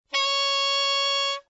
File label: D sharp 6, saxophone tenor